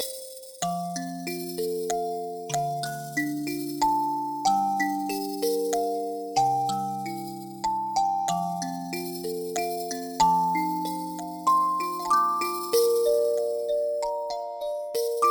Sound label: Irish Lullaby, Solos for Kalimba